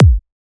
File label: Kick, Psytrance; Trooper